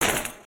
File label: Short Shock